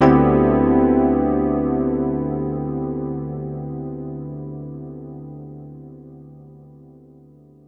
Electric Piano, Loops, Keys

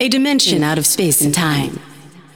vocals; SoundFactory; SMPMANIA

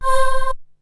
QIK CHOIR; SoundFactory; SMPMANIA

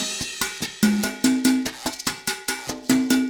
Acoustic Percussion

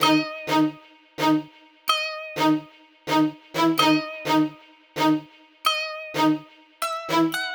Strings

